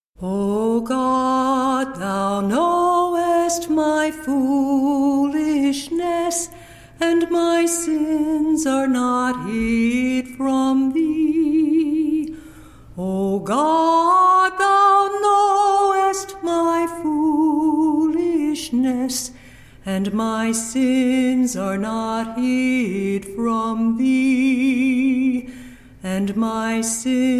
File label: a capella scripture song